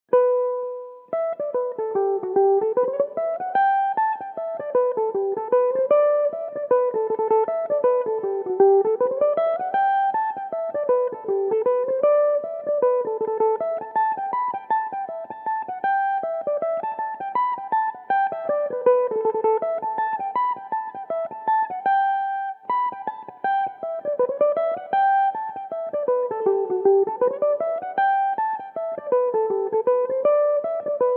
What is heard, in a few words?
reel G major, Irish mandolin